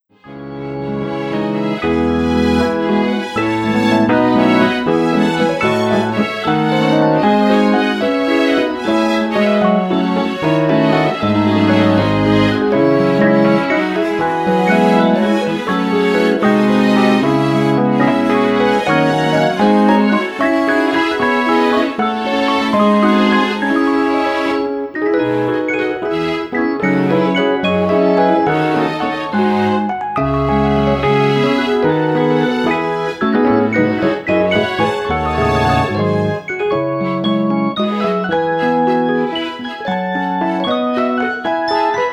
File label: courante